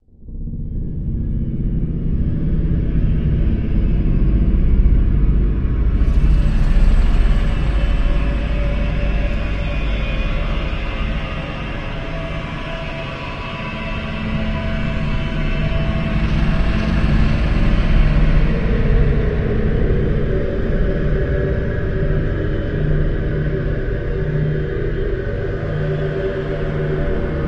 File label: Spiral Dense Dgtl Noise